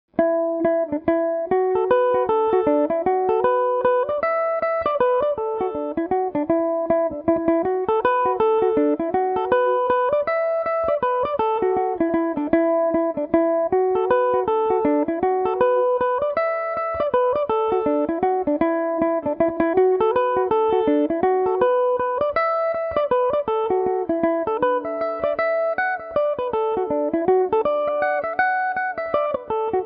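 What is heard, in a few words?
E minor, irish mandolin; killarney boys of pleasure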